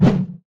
swish; midnight guns

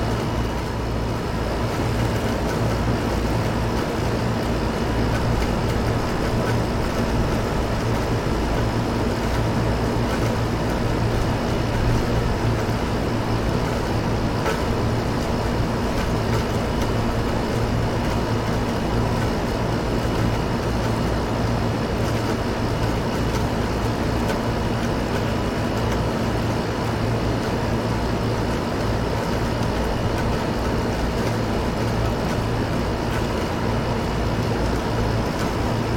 amb hvac; midnight guns

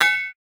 bounce; midnight guns; gpistol

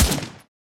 mp44 fire; midnight guns

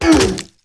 fall crater; midnight guns; bootsector male 00

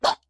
pain, rat; midnight guns

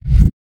stealth out, stealth boots; midnight guns

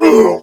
pain, male; midnight guns; bootsector male 00

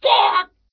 lava; midnight guns; bootsector male 00

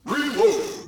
reload; backseat

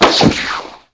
flag reset; midnight guns

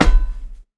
land metal, footsteps; midnight guns

